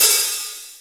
Open HH, Korg M1

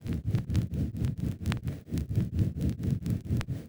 Rythmic Noise 130BPM

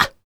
FEM PERC, Vocal Jazz FX

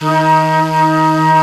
Flute, Singin' Flute

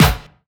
KIT, Dance Mega Drums, HIP; Best Service

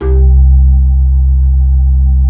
B-3, Blues; Keyboards of The 60's and 70's

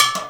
STICK; InVision Interactive; Percussion Slam; CAFFEINE

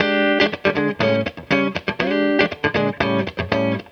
EASY GTR, Roots Disco; USB Soundscan vol.32; AKAI

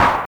CLAP, Drums Dance & Groove; USB Soundscan vol.11; AKAI; TRASHKIT

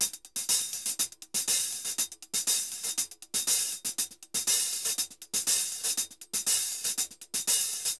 HH Samba 120 bpm; Best Service; Festa Latina VOL-1

